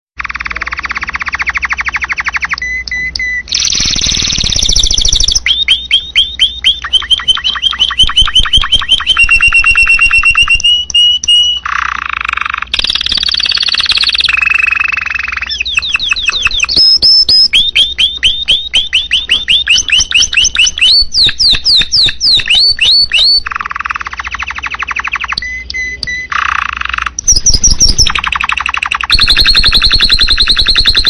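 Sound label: spanish timbrado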